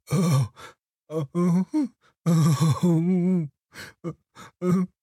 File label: moan